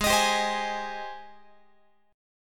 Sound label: G sharp Major 7th G sharp, Guitar; G#M7 Chord